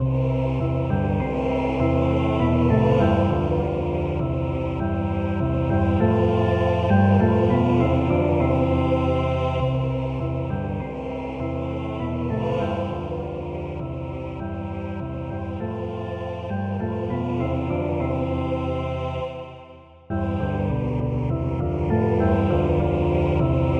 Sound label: polyinstru; hymns; Himnario grande